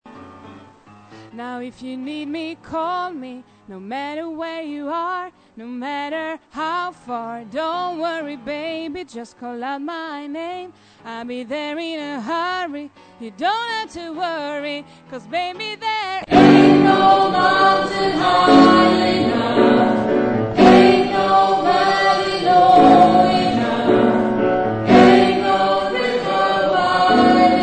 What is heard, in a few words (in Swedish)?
bas, stammor